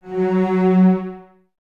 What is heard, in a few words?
cellos F#3, String Ensembles, Detache; Miroslav Vitous